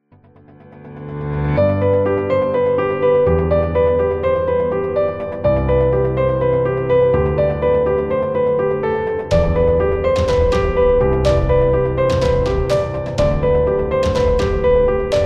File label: Piano and Strings, corporate music; corporate music vol 8